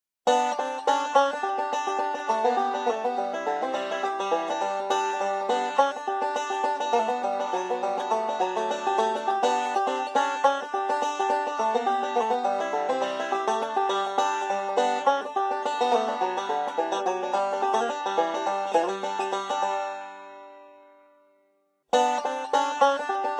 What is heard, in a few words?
Banjo; Zomboid; Lifestyle